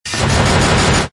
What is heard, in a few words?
Gunner Slams Tonal; Ninja Tracks